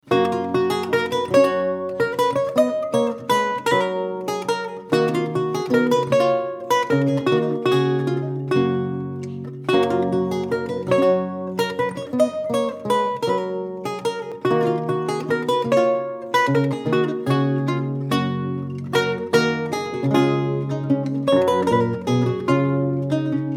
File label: Lute sample